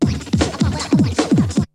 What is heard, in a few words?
BREAKBEATS, TECHNO